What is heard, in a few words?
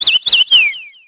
sparrow far; World of Tanks Blitz; ambient milbase